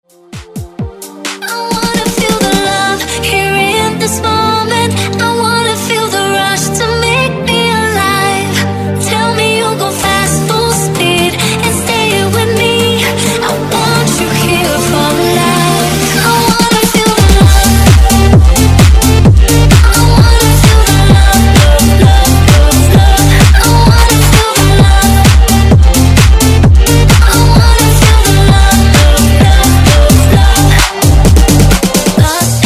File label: Dance Redrum Intro